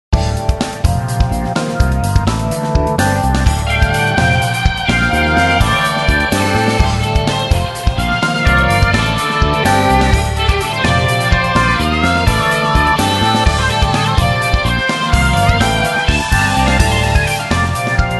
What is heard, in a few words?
erhu; PROGRESSIVE ROCK